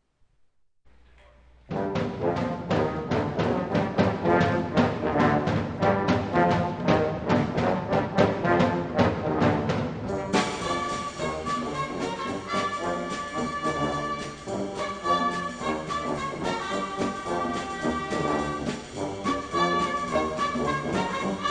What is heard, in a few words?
big noise